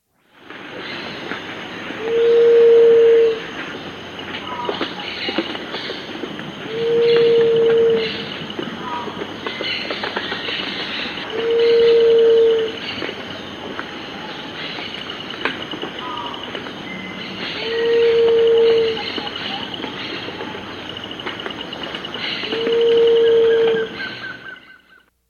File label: Gray-Chested Dove; S. America